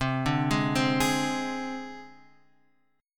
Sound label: C Suspended 2nd Sharp 5th, chord; Csus2#5 arpeggiated; JGuitar